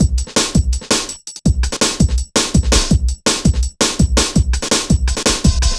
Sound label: Programmed Stepz, Jungle Drum Loops